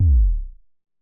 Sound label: Korg kick; Electro; Micro korg